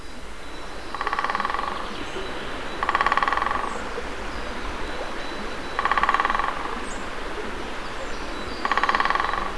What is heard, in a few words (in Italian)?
picchio rosso maggiore